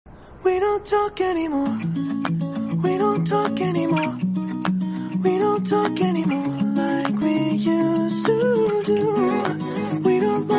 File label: slowed english song